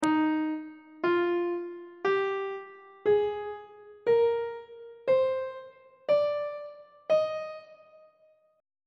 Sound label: e flat major scale